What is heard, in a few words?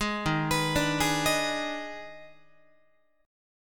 D sharp Major 7th Suspended 4th Sharp 5th, Guitar; arpeggiated; D#M7sus4#5; JGuitar